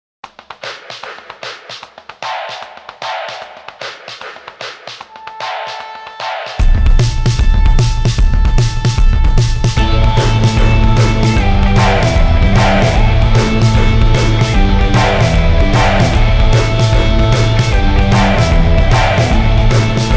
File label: The Beautiful Beat